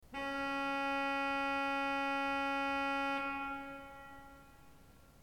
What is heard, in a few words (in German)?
ton, orgel